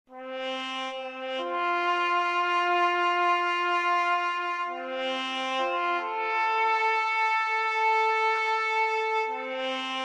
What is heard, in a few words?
taps